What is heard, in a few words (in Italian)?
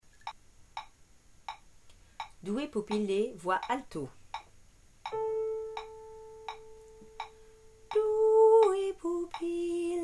due pupille alto